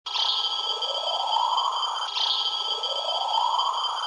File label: Alarm Min Beep